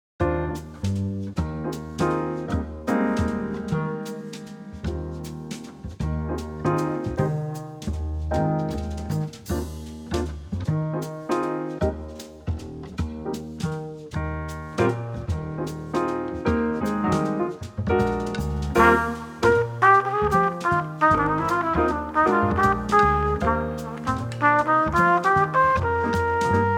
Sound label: waltz